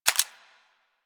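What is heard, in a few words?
submachine gun Mech; Weapons; Warframe; Tenno; Sundial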